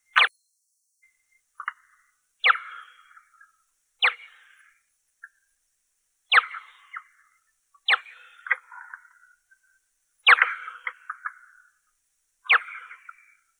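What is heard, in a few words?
brown long-eared bat, Plecotus auritus; ushan burii; zvuki prirodi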